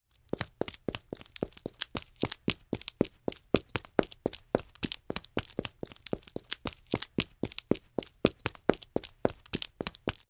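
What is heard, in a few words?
Footsteps Female Run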